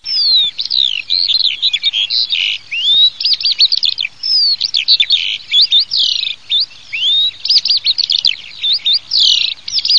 ALLODOLA

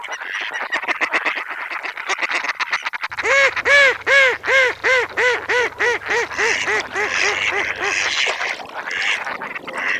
GERMANO

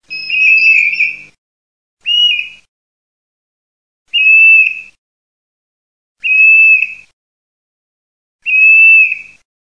PIVIERE MALTA

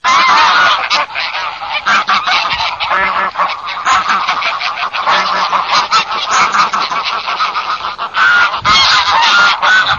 OCA SELVATICA GRUPPO

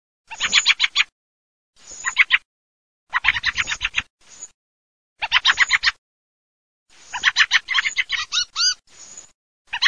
CESENA CANIN

